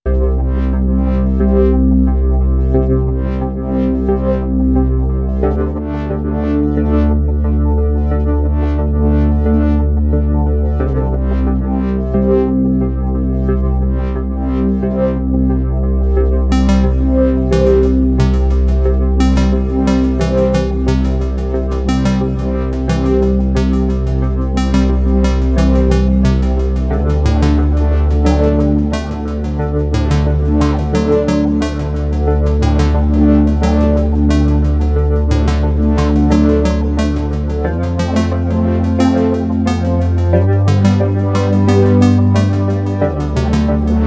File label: Ambient